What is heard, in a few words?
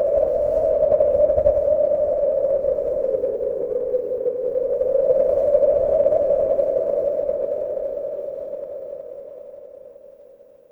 ambience wind